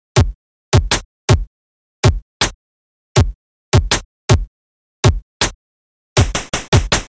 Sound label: 8Bit Default Dance